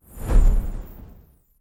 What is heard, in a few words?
buildup; ares; smite; ares v4 skin16